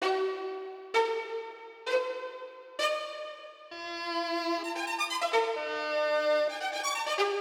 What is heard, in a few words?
strings; Pony Fan Music Remix Stems